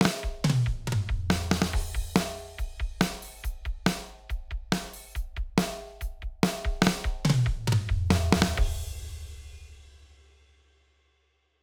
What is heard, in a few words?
Swedish Metal Dry